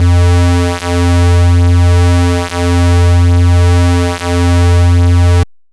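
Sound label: bass sustain cyber F; Polyend; ARC Noise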